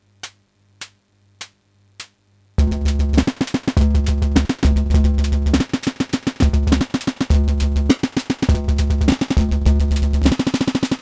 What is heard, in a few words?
casio sample loop